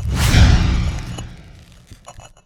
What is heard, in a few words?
Flesh To Stone